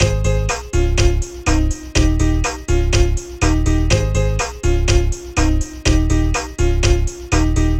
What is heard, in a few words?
Tropical Beat without Trumpet